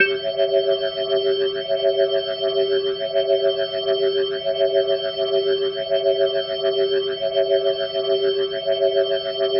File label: Glowing Organ A7 and 8va; Dominant 7; The Vitale Organ